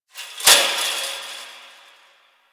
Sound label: Fence Rattle One Off; Warframe; Ambience; Nineteen Ninety Nine; DE